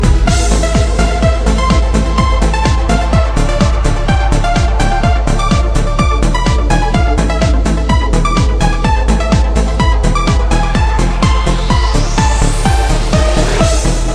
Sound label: LG Ringtone